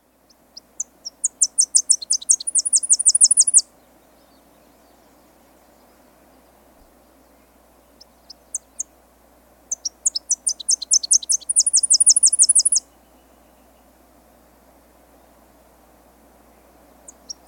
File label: Tennessee Warbler